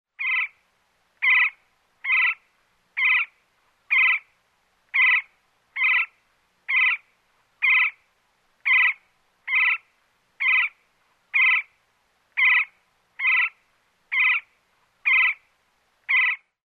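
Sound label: sounds of woodpecker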